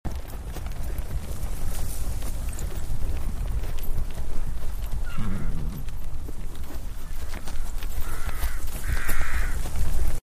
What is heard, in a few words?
gathering horses; Celtic Sounds Of Middle Earth 2